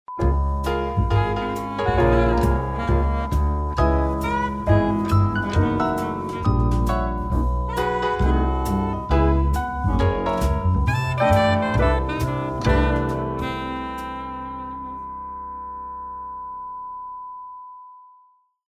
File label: groovy jingles